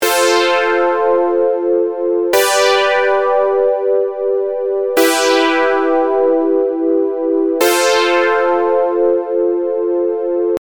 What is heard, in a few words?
Keys; PatchFactory